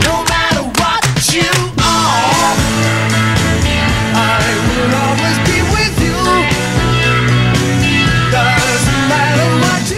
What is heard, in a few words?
leslie